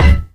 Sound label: physics cube impact hard